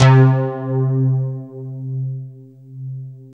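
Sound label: synthesizer, digital; Sound & Vision - Gigapack I CD 2 (Roland)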